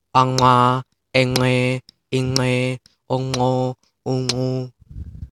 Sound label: Bilabial nasal click